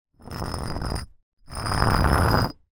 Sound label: foley brick short drag on hard ground; zapsplat